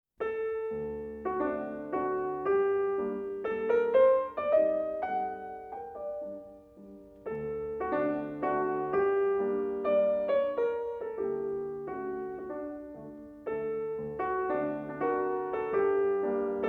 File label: Mazurka N 14 in G minor, Op.24-N 1, Chopin Piano